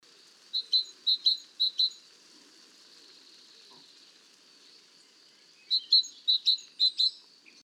chant; Chant 4 vitesse originale

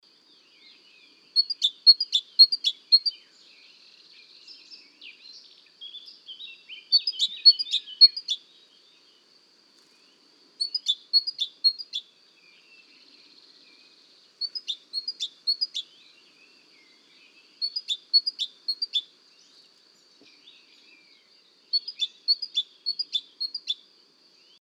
chant original; Chant 5 vitesse originale

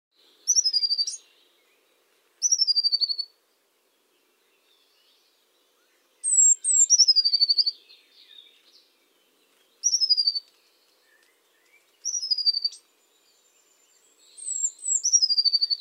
MESANGE BLEUE; ELBE

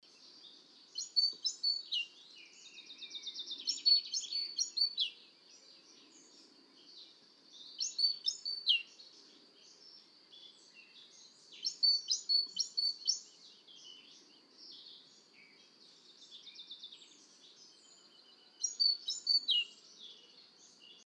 chant original; Chant 3 bis vitesse originale

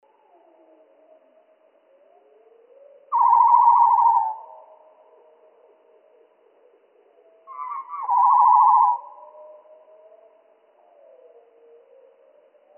GOBE MOUCHE GRIS MOINS TROIS OCTAVES MONO

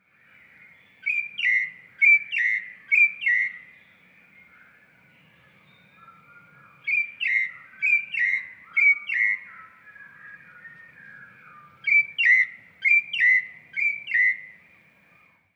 chant; Chant 3 moins 1 octave

